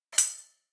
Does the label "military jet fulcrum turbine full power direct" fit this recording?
No